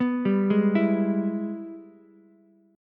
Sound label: G sharp Minor Major 7th Sharp 5th G sharp down Ukulele; arpeggiated; JGuitar